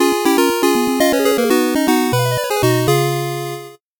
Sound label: 8bit jingle slow